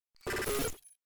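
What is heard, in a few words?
signal glitch; افکت صوتی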